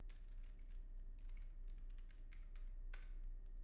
keyboard; IEEE AASP

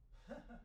laughter; IEEE AASP

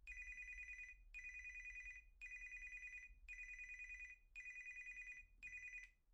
phone; IEEE AASP

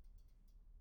mouse; IEEE AASP

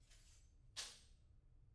keys; IEEE AASP

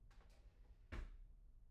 drawer; IEEE AASP

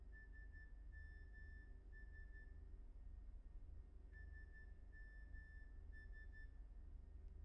alert; IEEE AASP

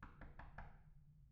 knock; IEEE AASP

